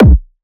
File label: kick blam; Drum Sample Pack; One Shots; Slate; SEISMIC